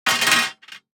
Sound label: DICE on Metal, Throw and Roll, Standard, 6 Six Dice; Samples; ArticulatedSounds; DICE Sfx Party (Extended)